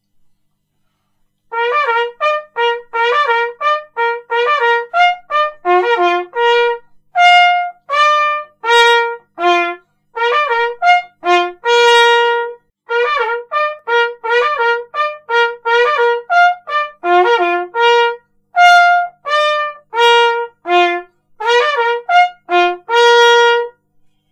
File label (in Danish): blishønen